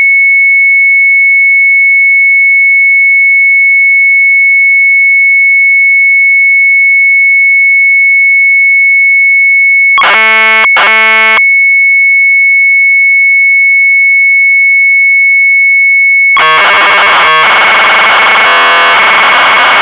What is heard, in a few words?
MZ800 Tape; sharp; HELICOPTER; retromelsarchive